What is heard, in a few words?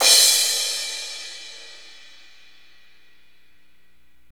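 cymbal crash, Roland; Northstar; Drumscapes Roland; Cymbals 2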